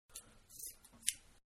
Scissors; Offices; Manhattan Production Music

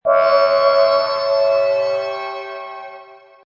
Scarey, Servo Panel Sound; Scary; Button Sound Effects; Multimedia; Lynne Music

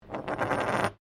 Coin Penny Spinning, Old Australian pennies; Money Sound Effects

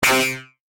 Digital Alert Distorted Tone; Digital Clicks, Blips & Alerts; Digital Sound Effects

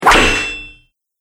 Bastard Sword Plate Mail Impact

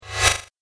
Sorcery Quick Zip, magic sound effect

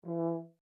HORN E4 MF SHORT, French Horn in F; Alexander French Horn in F; Horns; Musical

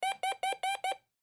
Metal Detector, Metal Detector Alarm Short; Security Wand Airport; Emergency

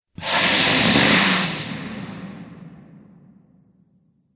Fire Breathing Monster; Monster Sound Effects

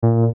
button synth bump; Buttons (Sound Effects:Multimedia)

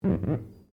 GLASS SQUEAK FINGER

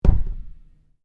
Ball Swiss Bounce, Large Swiss excesize ball; Equipment (Sound Effects:Sports)

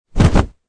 Body Fall Human; Wrestling and Struggling; Combat

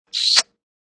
Kiss, Strong kissing sound; Human

